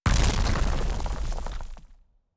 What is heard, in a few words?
Nature Spell, Magic Sounds; Fantasy Sounds Bundle